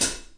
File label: Hat A3 Foot mp; REAL LIVE KITS